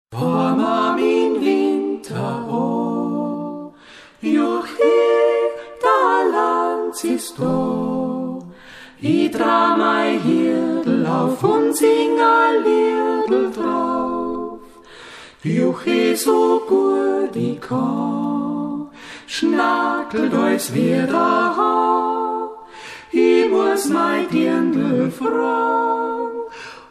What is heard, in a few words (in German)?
Dreigesang; Fahrn ma mit'n Winter a; Salzkammergut